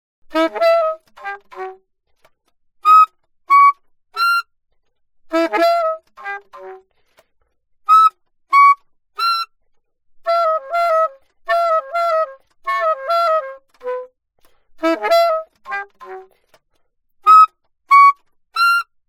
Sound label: altosax